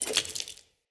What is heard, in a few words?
Amb Ruin Stone Crumble; Ambience; Mendicant Area